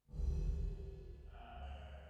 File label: 480 Rev